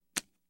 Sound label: Card Flips Card Turn